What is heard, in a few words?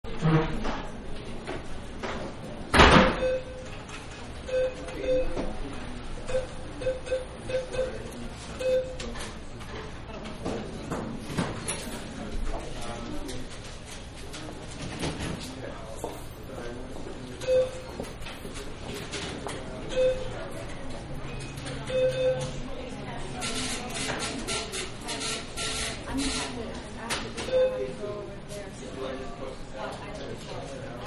Bank Ambience; Buildings; Backgrounds; Manhattan Production Music